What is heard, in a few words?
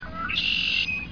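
red-winged blackbird, birds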